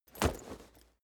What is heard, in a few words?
leather metal impact